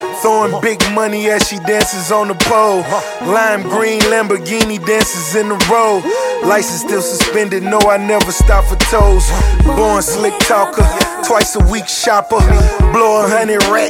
triumphant ringtone

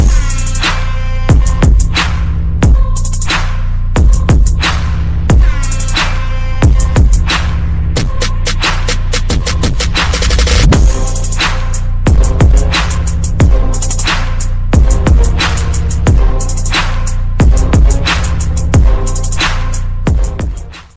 dj remix ringtone; english ringtones

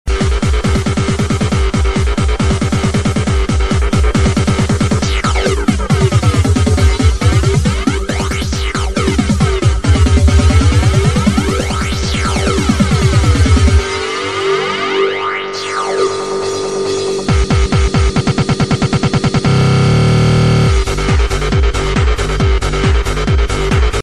fast beat ringtone; blade

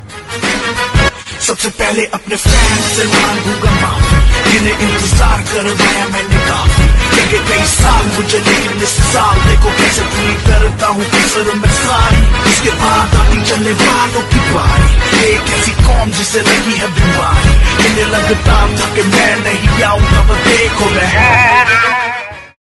romantic music ringtone, bollywood

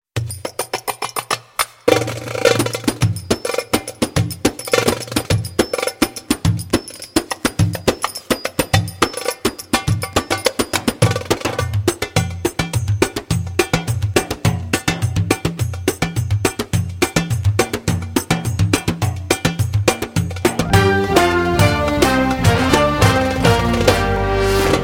turkish rhythm ringtone

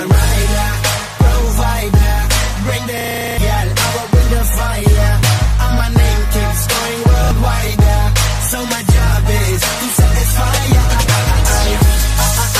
hindi music ringtone

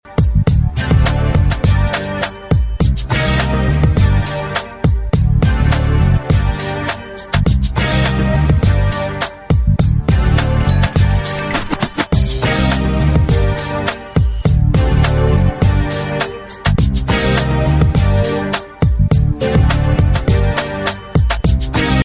tik tok beat ringtone

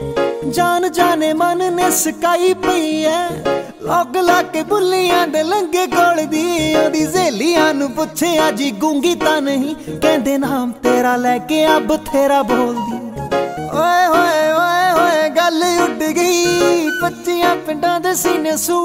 punjabi ringtone

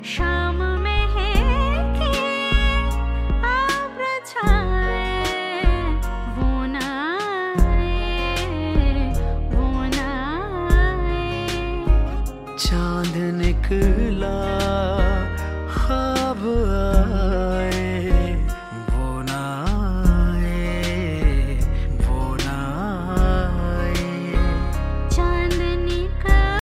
ringtone, bollywood ringtones

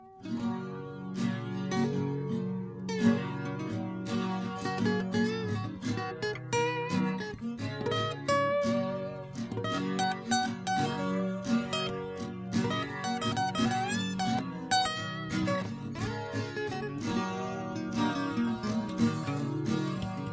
soft guitar background music tone

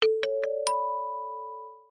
elegant coin falling message tone ringtone

